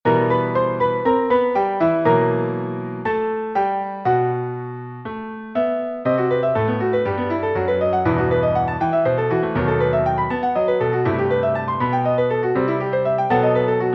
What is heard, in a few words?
Music Box